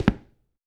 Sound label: Dress shoe